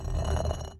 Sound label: slide; qwokgames; tanew